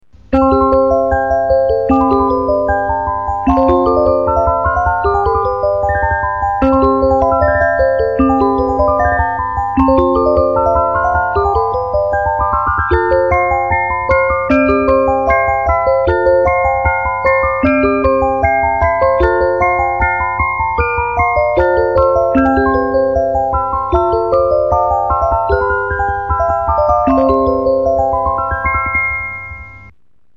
reuge musicnight, boites musique

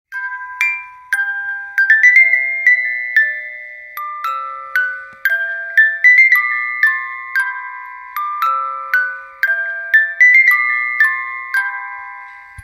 boites musique

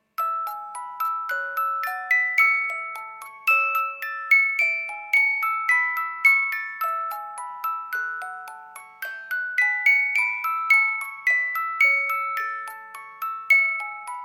fantaisie impromptu, boites musique

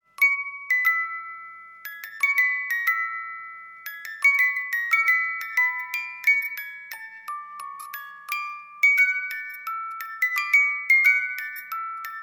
polonaise, boites musique

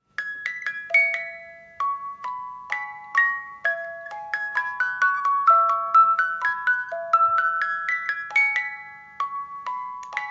boites musique

